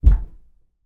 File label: footstep thud from upstairs above floorboards